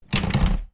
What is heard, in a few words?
blocked, door; rust-rl; door sounds, ambient tracks